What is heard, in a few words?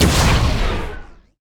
frost arrow; weapons; dota; drow ranger